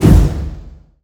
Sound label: Cancel; Totaled; XBOX